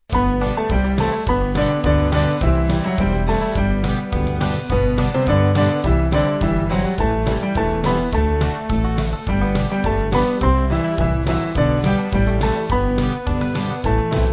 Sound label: Come And See, Marching Georgia, Band; Small Band; 3/G-Ab